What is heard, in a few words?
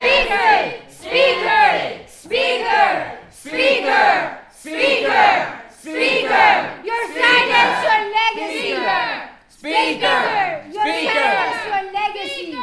human crowd small gathering yell speaker; congress director office usa; felix blume